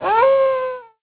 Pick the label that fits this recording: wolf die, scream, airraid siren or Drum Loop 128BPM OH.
wolf die